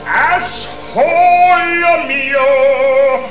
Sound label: osolemio